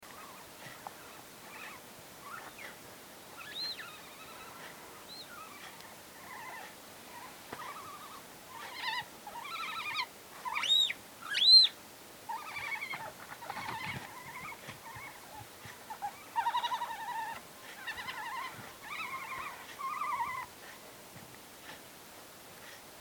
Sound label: otter noises